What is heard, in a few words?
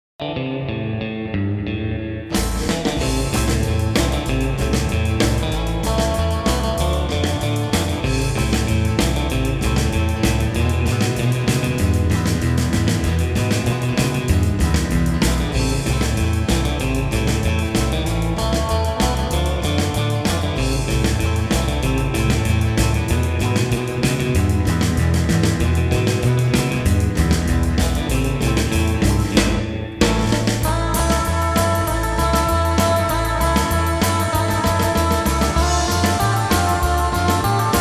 gipsy beat, rockabilly